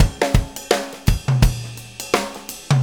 Jim, Drum Tools, Loops; Sonic Foundry (Sony Creative Software); Loops & Samples Drum Tools

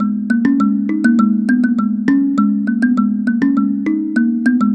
Mbira Low; Melodic; Ambient-Melodics-II

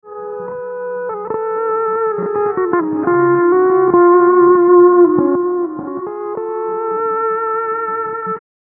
Guitar FX, Stringed Instruments, World Pop; Sonic Foundry (Sony Creative Software)

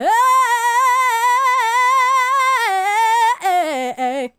Female Solo, Hip-Hop and RnB Vocals

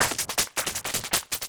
Beat, Industrial

